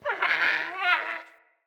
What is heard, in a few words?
Trapped Beaver Call; Encounters; Animal Rescue